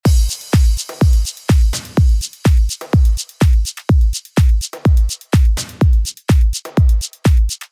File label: groove